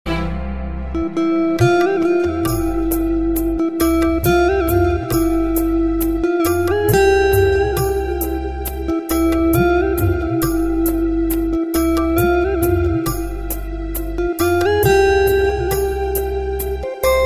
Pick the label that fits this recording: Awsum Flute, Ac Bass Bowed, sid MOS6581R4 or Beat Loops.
Awsum Flute